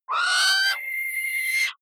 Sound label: owl shriek pair; natur tiere; voegel